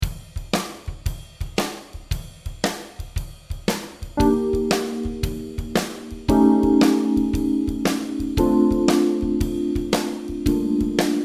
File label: II V I VI in Bb no bass band